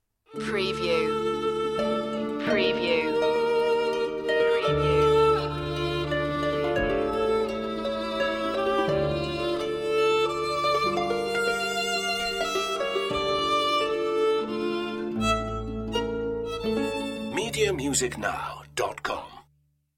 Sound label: solo violin and harp